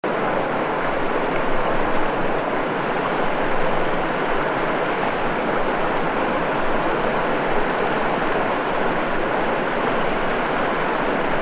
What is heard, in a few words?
river